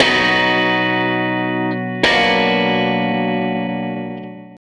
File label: Tele Guitar Loop